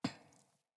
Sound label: Grunt Boot Walk Metal, Footsteps, Boots; Enemy; Sinecure; Melee Grunt